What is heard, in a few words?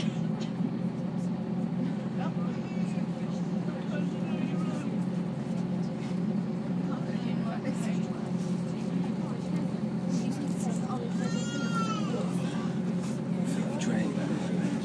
rural bus noise